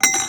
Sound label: Moody Park Chimes Perc; Houston